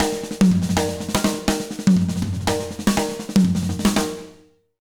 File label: BEAT, Jazz Latin Drumloops; 120 BBEAT; USB Soundscan vol.08; AKAI